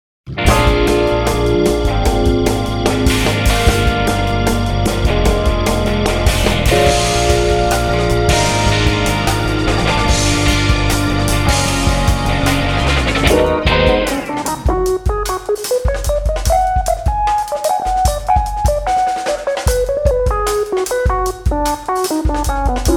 new gear fun

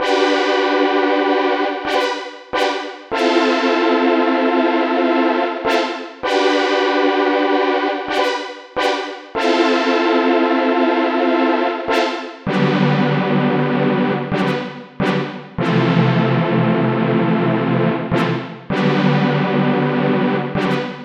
Old Analog Brass CS80

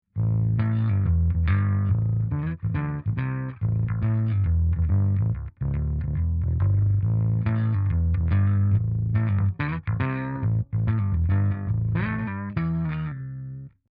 Saturn Octave

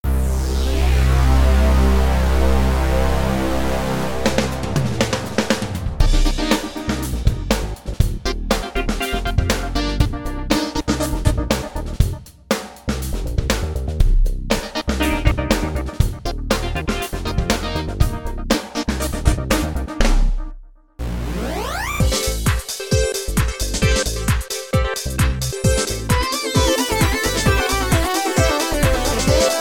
reverse curve

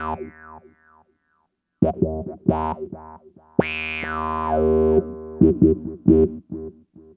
wah synth

